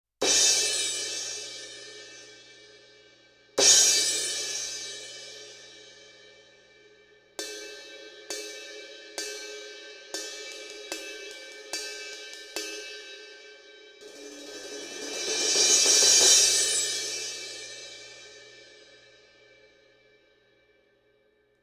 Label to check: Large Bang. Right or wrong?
Wrong